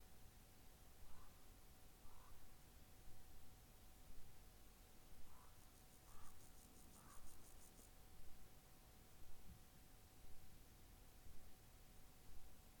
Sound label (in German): Sumpfschrecke